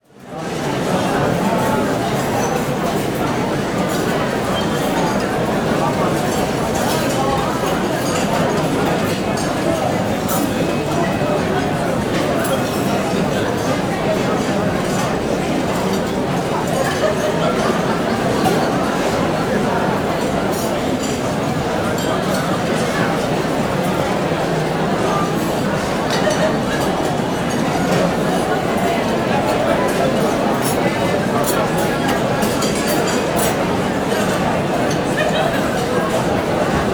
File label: Restaurant Busy, Walla; Sound Ideas; Walla Series